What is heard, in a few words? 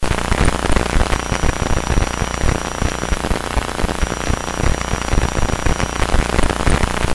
Glitching Noise